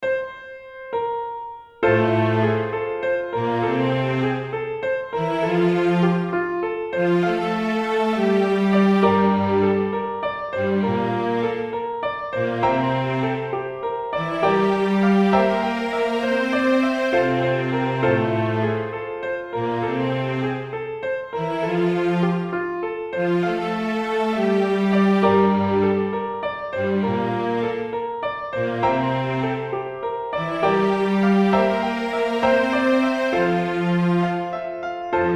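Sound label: quad melody with viola and cello slow tempo; Partie de Quad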